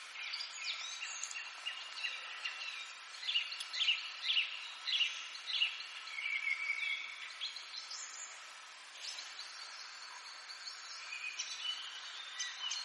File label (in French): ambiance lisiere de bois le soir